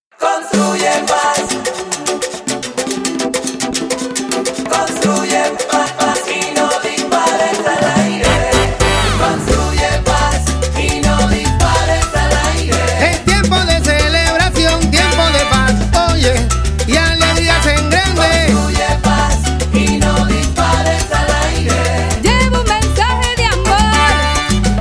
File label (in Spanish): Audio Jingle Policia